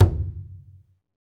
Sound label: Skin Drums; Roland LCDP14 Africa VOL-2; Af.Hand Drm2